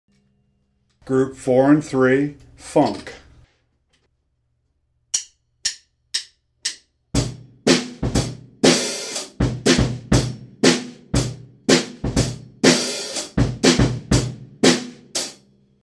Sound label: funk